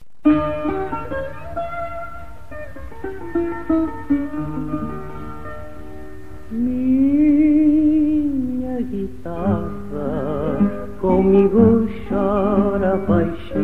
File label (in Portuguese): guitarra